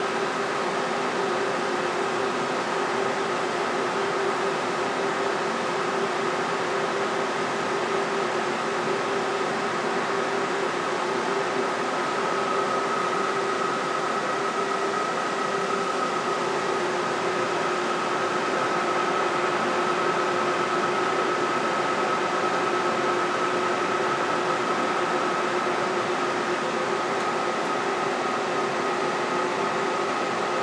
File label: server room noise